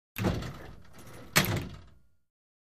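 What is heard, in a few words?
Gym Weight Equipment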